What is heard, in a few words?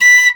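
piccolo staccato B5; Flutes; DirectWave; Varazdin Orchestral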